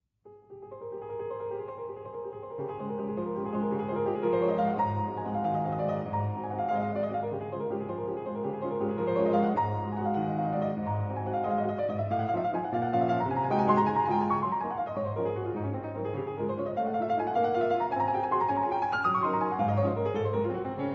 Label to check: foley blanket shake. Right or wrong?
Wrong